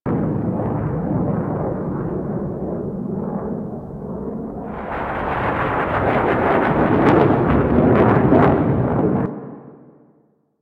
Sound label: Ambient Ship Pass By Loop; Ambience; Props; Loops; Warframe; Venus Open World; Venus Landscape